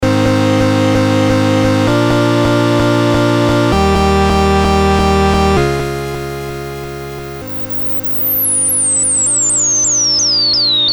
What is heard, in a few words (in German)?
SP12 CHORDS Extrem Reso